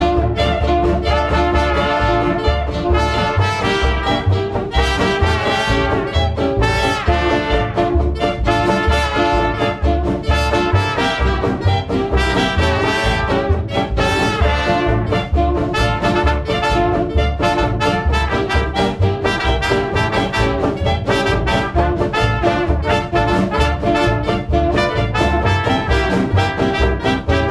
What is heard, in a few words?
Orchestra